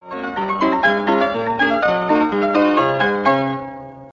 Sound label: canadian jazz